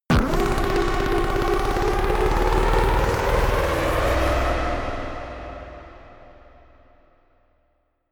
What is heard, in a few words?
reverb rumble, risers